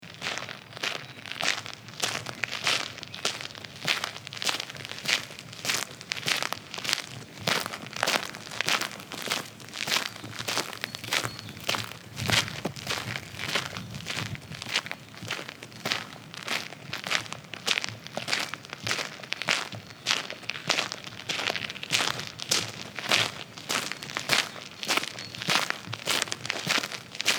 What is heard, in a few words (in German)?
schritte